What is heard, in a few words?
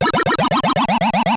sting, Arcade